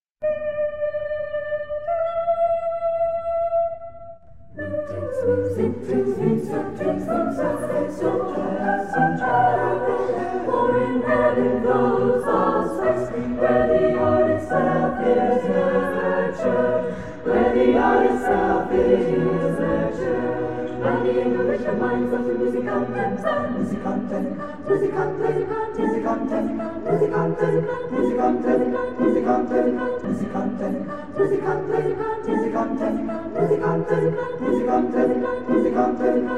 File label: Chorale; Distler, Hugo - 'Vorspruch' ('Before Saying'); USC Chanteclairs